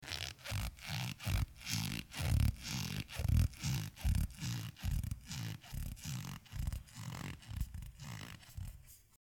Rubber

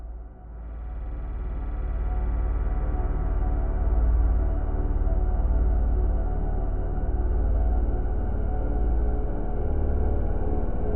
Drone; Drones

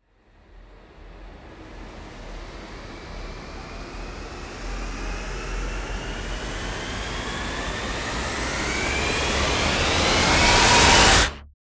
Riser

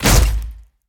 Cinematic Impact

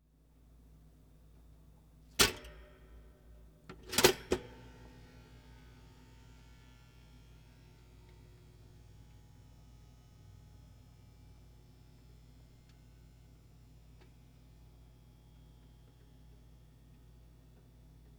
Toaster; Cooking

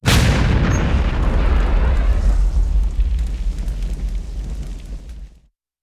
Tension Impact

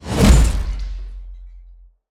Slam Impact

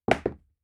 Throws Shoes

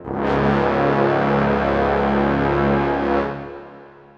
Dramatic Brass

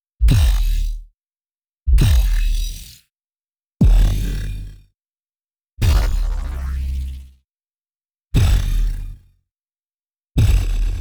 Impact Electro Bumps

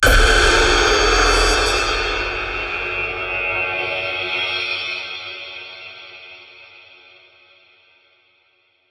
Tension Builder Impact

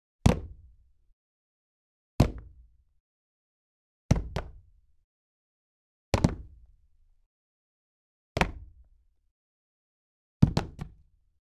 Wood Hard Sneakers And Jumping, Footsteps

